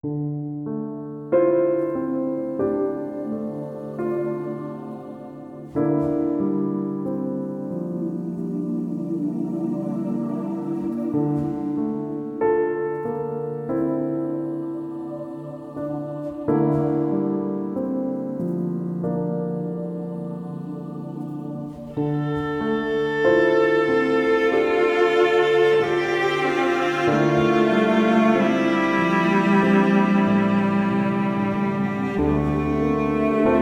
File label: Disheartened